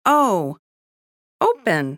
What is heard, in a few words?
OPEN